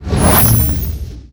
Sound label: skill explo